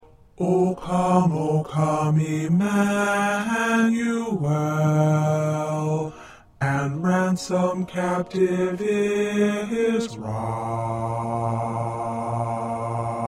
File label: Bass, choir parts; O Come O Come Emmanuel